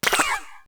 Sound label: Paint gun drop; littlebigplanet 2 ps3 gamerip 2011